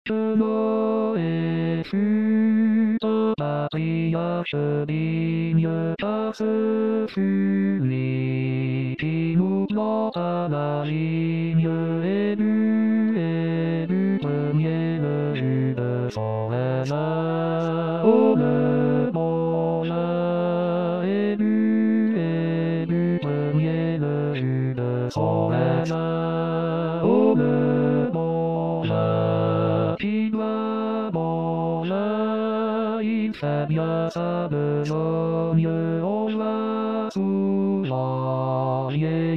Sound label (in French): basse